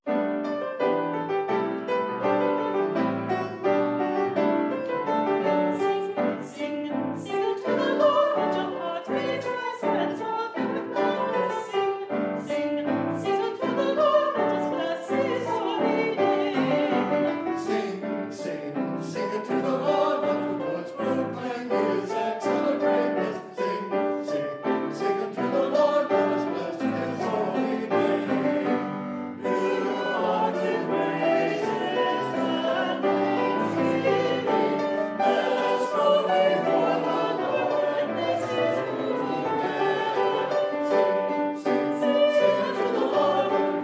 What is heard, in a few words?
sing unto the lord choir